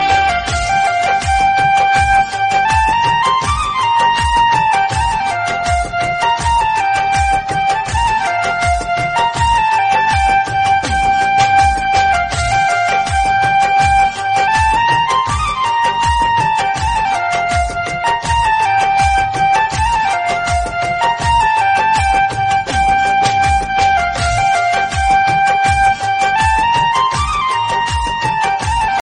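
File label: sad bansuri instrumental; ringtone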